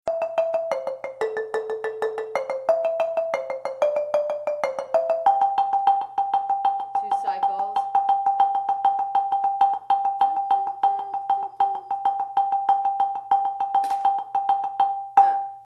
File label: lead low signal, marimba